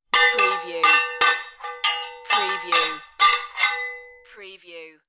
Swords Clash

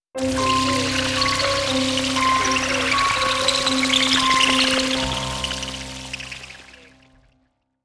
Dark Production Stinger

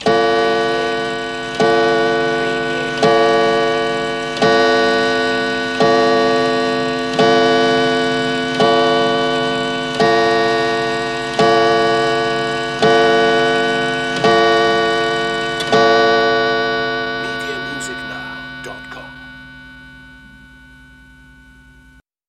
Chime Antique

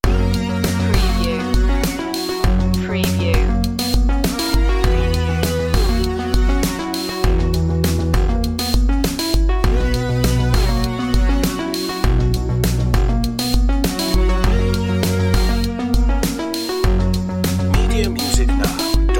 electro hop loop

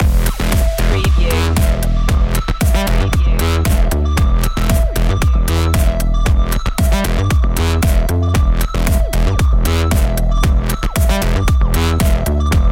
cosmic funk loop

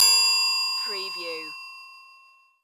CARTOON BELL

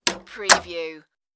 apartment door close

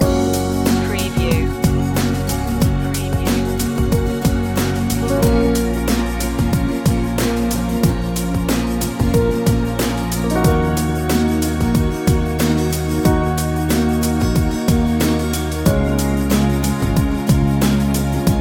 seaside walk loop

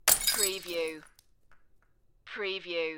Glass Bottle Break

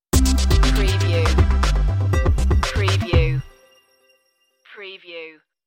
bustle hustle stinger

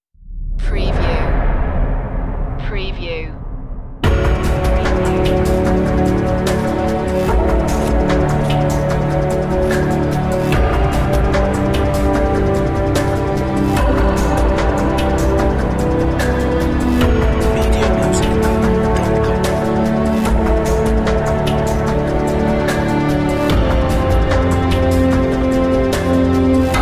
underworld series dramatic cue

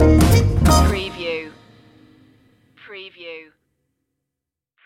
in the bleak midwinter jazzy stinger

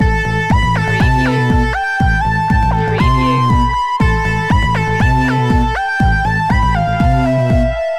unnatural science loop

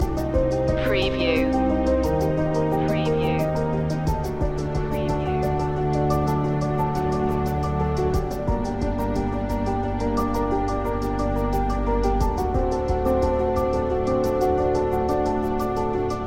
motion loop

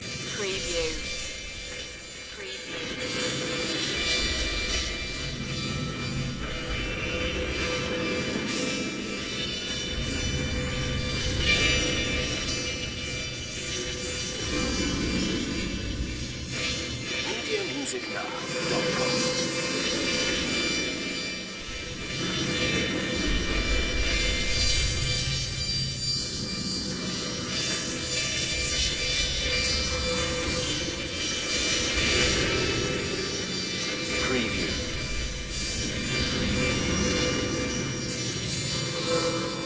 futuristic electric ambience loop

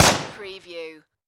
Machine Gun M4A2; sound-effects-bible

